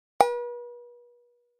Pop Up Guitar String P, pop up element

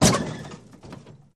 Auto Crash Lrg Head, car crashes

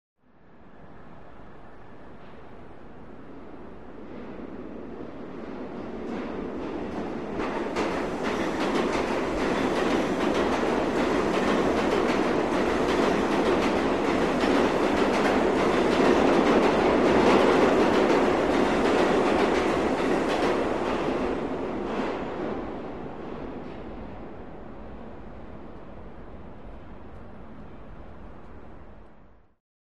Subway Train Pass By F, subway

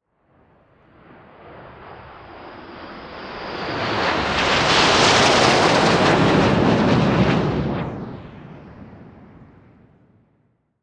Plane OH Jet Formation, hawk

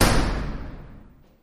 Door Metallic Slam; close

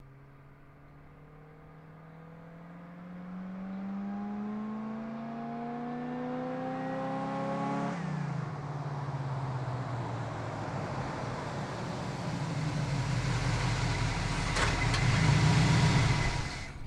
Blazer Drives Fst Stp, chevy blazer

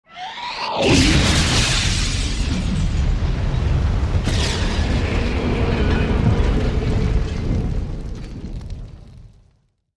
Fire Rush Explosion, metal & glass

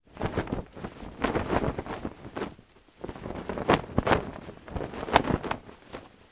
Flag Gentle Flapping In Breeze

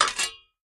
Shovel Picking Up Me

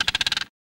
Sandhill Crane Cluck

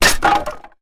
Bullet Hits Wood Ratt

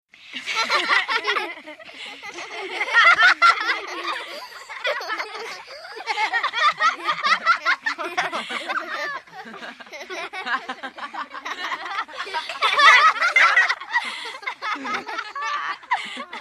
Group Kids Laughing S

